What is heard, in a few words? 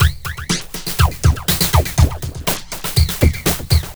Drum Fx Loop, Electronica